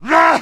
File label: man hit; Mount&Blade Warband